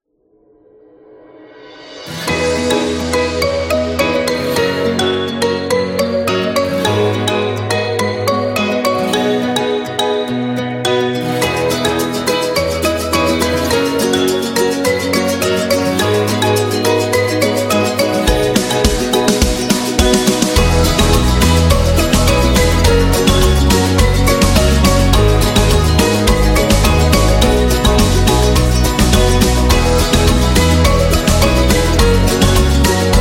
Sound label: Thailand